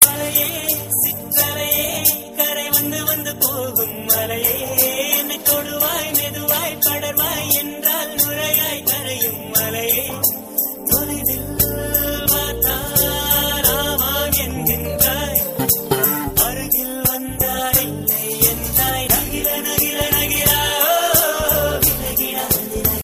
new arabic

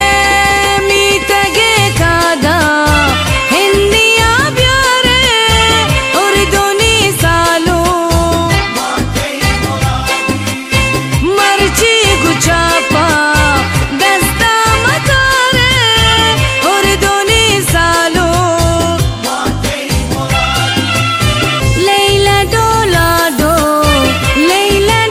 omani, ringtone

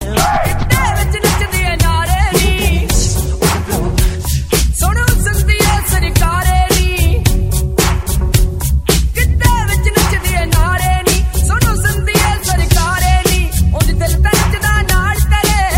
punjabi ringtone

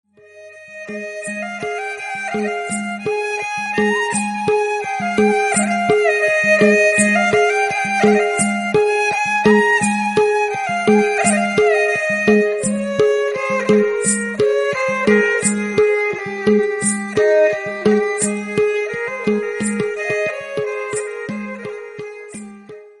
nepali classical; ringtone

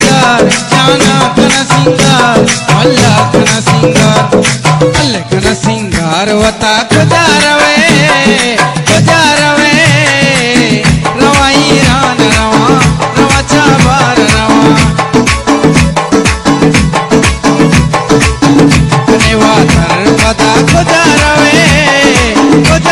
balochi omani ringtone

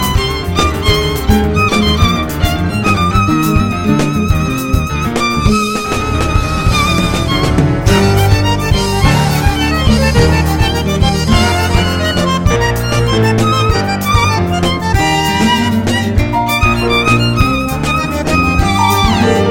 latin tango, ringtone

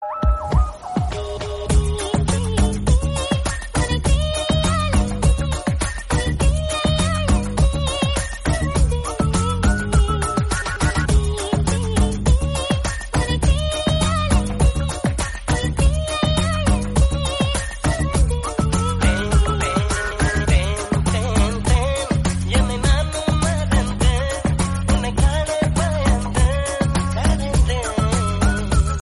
hot tamil remix

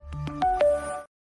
notification

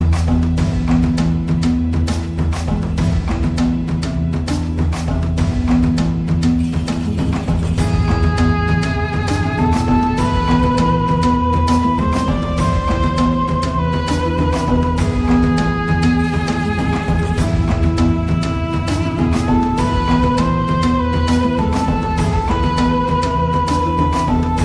ancient egyptian ringtone

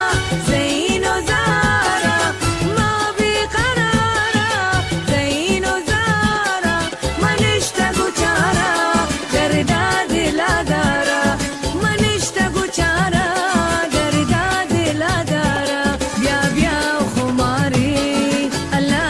song ringtone

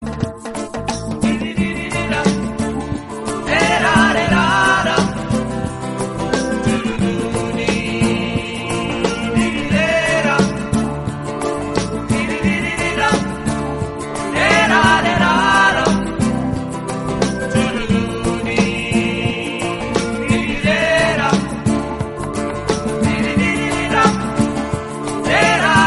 sweet instrumental ringtone

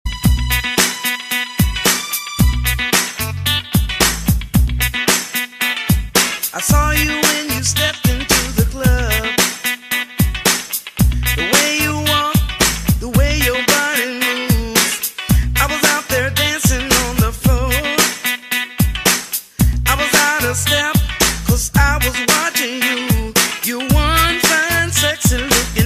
ringtone

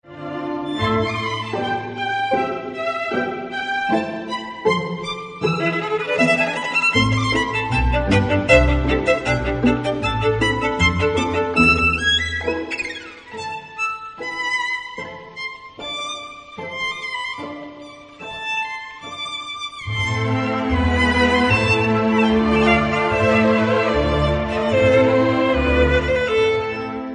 russian dance

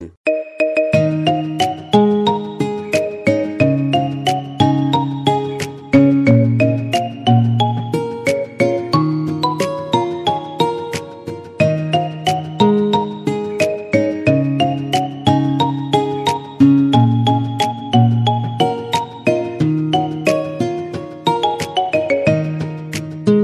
marimba remix

